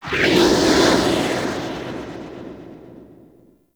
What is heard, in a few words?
Exterior Solar Winds; Ambience; Warframe; Corpus Railjack